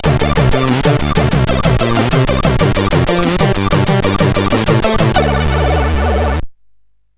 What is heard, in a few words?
Game Over, sid; CSG8580R5; Future Freak